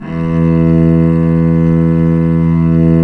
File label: cello, Arco Solo; String Master Series